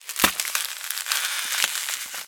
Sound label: frozen; ice age scrats nutty adventure